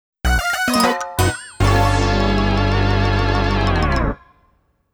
Team Defeat - No Record Fanfare; Mario Kart Wii; Team Defeat (Battle)

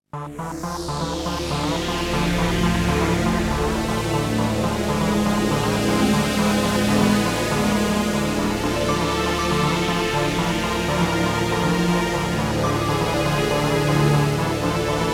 BGM; baroque; wii

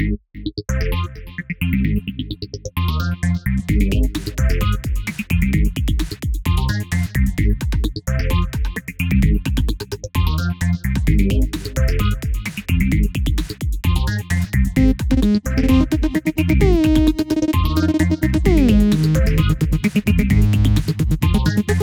trailer Music; Systematic Immunity

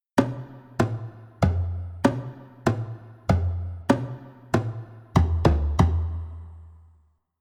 Drums; majora s mask 20th anniversary tribute

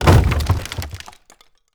wood break; teardown; ps5 windows xbox series xs

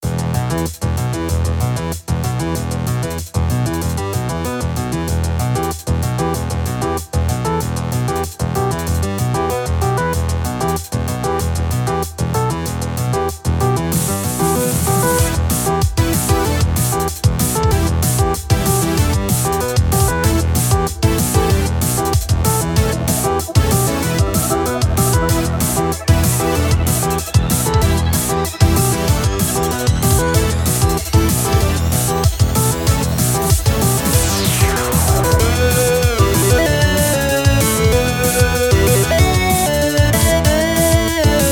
bgm Engines; Robo's World The Zarnok Fortress

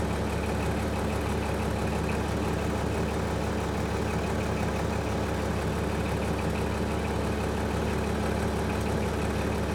vehicle frontloader idle

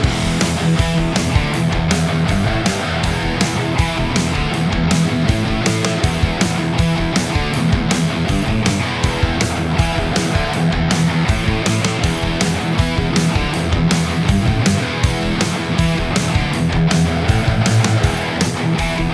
Boss Loop; Okunoka

